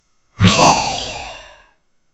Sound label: boss fight; sound effect